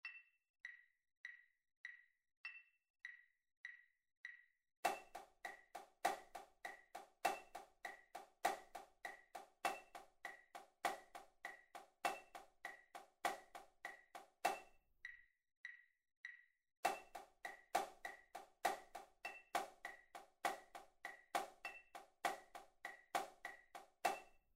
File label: Tap Accent 100 BPM